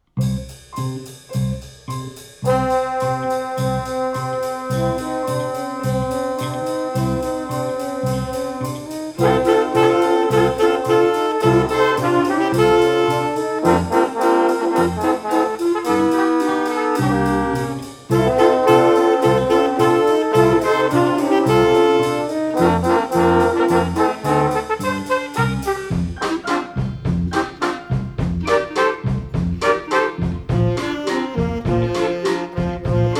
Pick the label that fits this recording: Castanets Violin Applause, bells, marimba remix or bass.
bells